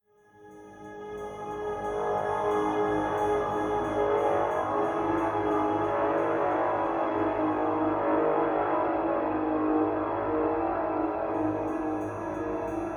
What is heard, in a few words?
Stinger Long; Execsearch